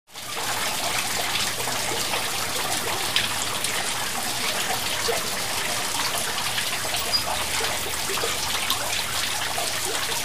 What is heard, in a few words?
Water Splash Gutter, drips